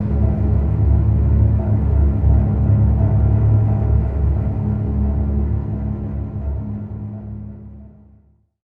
Defeat; Iron Brigade Windows gamerip 2012

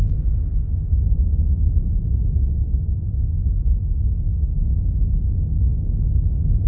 Engine loop Last Amb; Puddle; puddle plus ios gamerip 2018

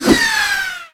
dodge object; Clown Dodger

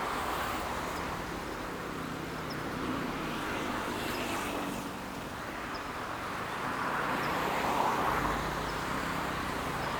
Station Front; Tokimeki Idol

